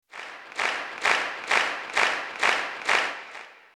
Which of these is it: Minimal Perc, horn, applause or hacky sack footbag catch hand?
applause